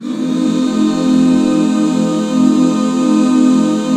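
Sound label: HAL F 7 left, Back Chords; Sound & Vision - Gigapack II CD 1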